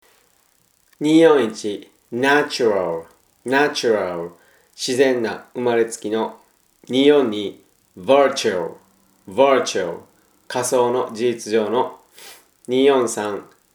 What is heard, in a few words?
tango